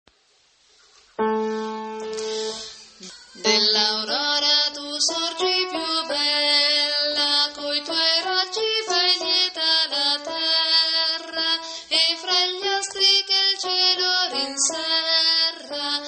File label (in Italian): contralti; dell aurora tu sorgi piu bella